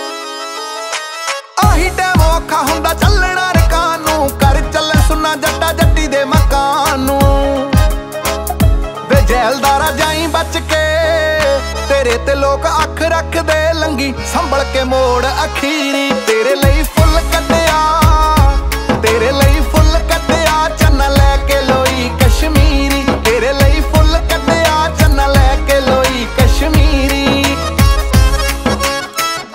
Punjabi Ringtone

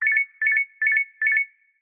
Notification Ringtone; LG Q Stylus

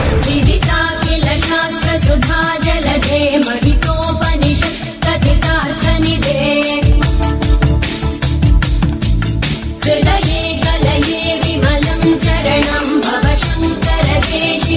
Suprabhatam Remix Tamil Song Ringtone

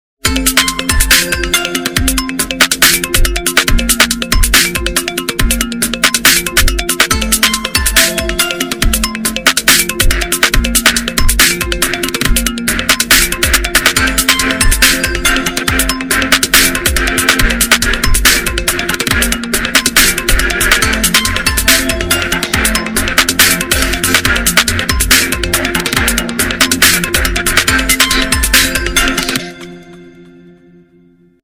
Oppo A53 Official Ringtone

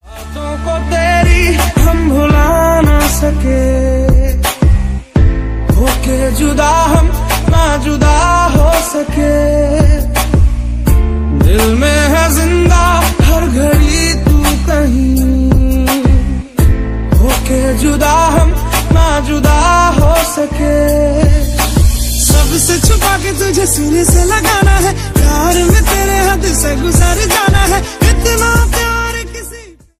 Song Ringtone

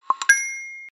Notification Ringtone; Google Pixel 8 Pro

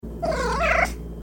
Cute Cat Notification Sound

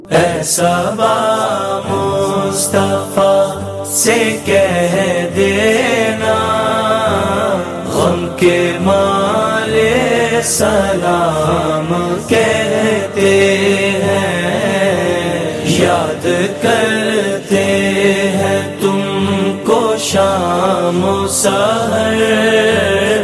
Islamic Ringtone

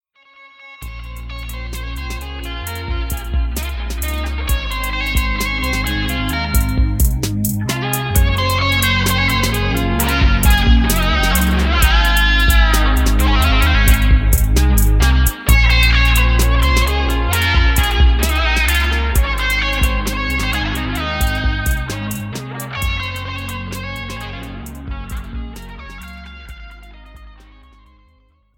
Relaxing Guitar Ringtone

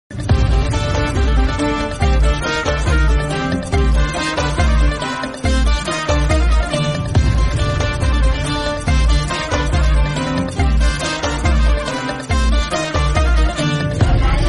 Malayalam Movie Ringtone Music

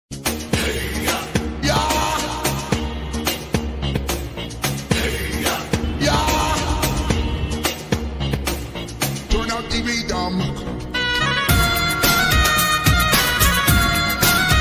Hindi Music

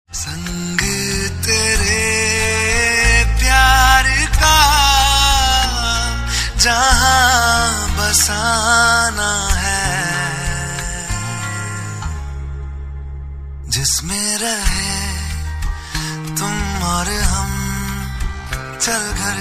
Sad Hindi Ringtone

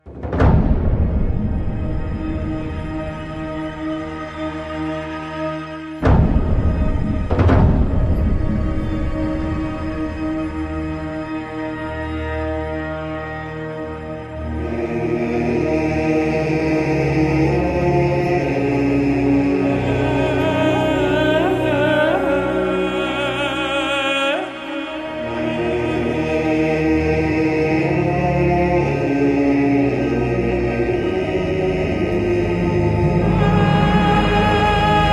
Music Ringtone; Baldur's Gate 3

